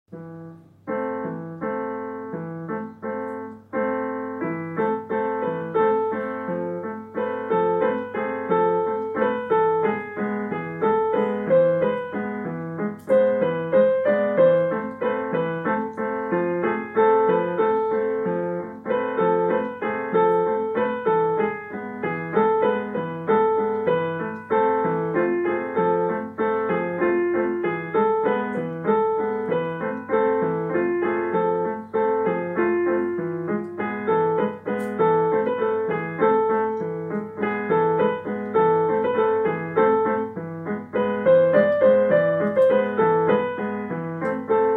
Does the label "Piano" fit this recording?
Yes